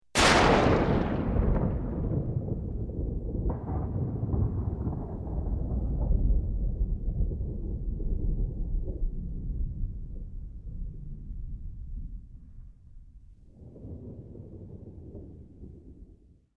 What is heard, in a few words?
Thunder Claps Single Clap